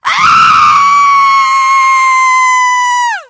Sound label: fall, teengirl; Left 4 Dead; Source Engine; survivor